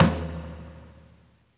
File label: Closed Hats